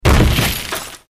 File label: Medium Impact W Debri, metal & glass